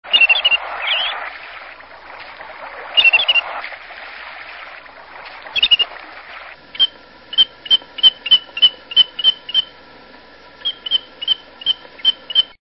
Wandering Tattler, songs

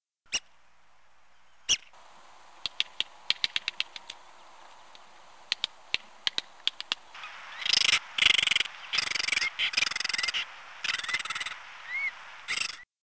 Red cockaded Woodpecker

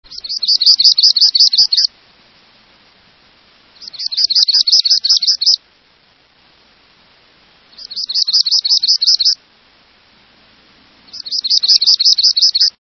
Palm Warbler

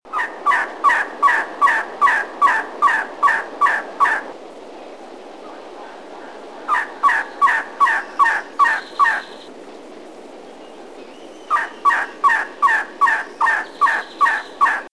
Elegant Trogon, songs

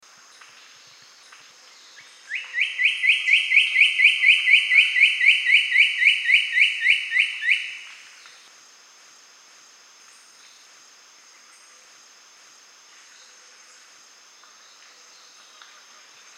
Cocoa Woodcreeper

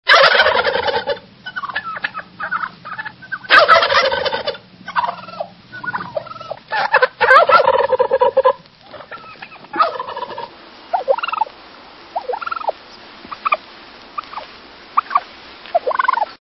Wild Turkey, songs

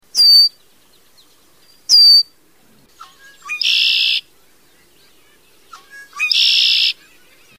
Red winged Blackbird

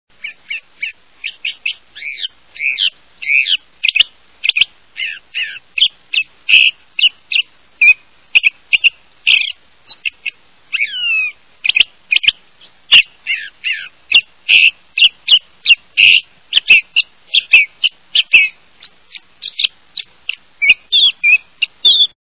California Thrasher

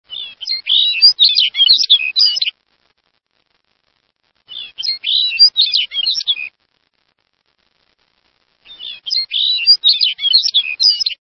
Lapland Longspur, songs

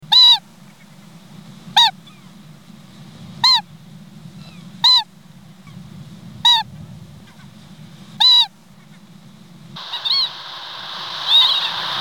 Whiskered Auklet

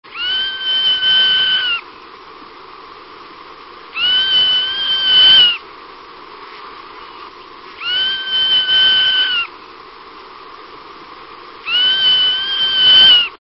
Zone tailed Hawk

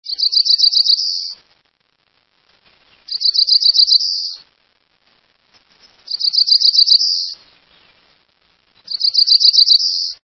Cerulean Warbler

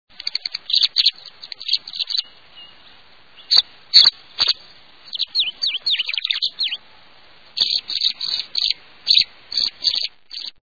Tree Swallow, songs

